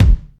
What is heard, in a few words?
sharp kick drum sound C key, steel kick drum tuned to the C note